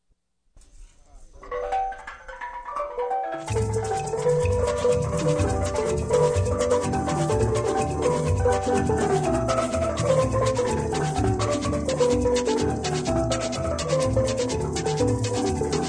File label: steel band clash